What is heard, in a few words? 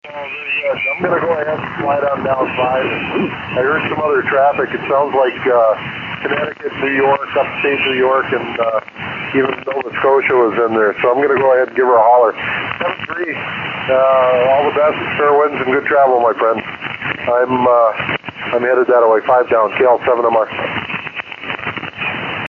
50.138 MHz, ham radio; 10 July 2007 - Alaska